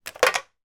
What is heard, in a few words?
food chocolate coin in foil wrapper drop into tin